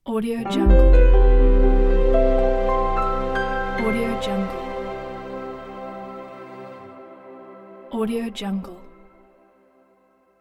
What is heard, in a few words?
piano logo; denaks